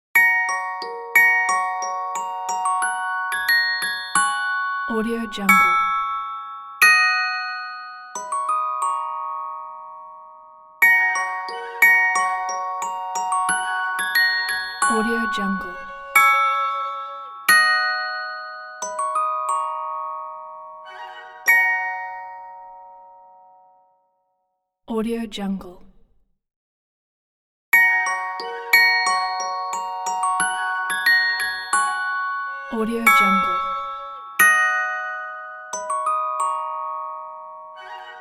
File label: blissful bells ident